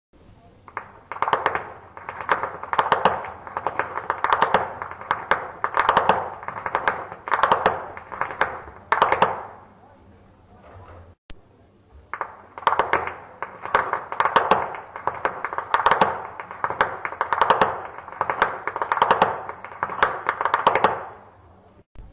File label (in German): schnalzen; saaldorf